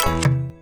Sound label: turbo off; buttons; Xing Yun Jin Chan